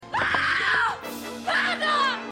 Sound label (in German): Schreiendes Cabaret Girl; Schmigadoon